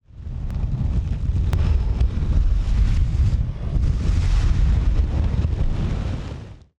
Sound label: fire